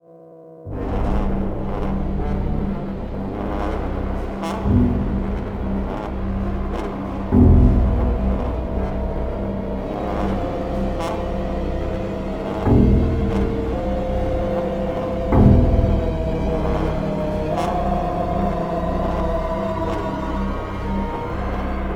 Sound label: Horror Drone